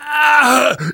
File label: choke; namvet; Left 4 Dead 2; left4dead2 dlc2